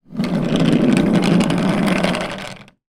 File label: toy car plastic roll wood floor; zapsplat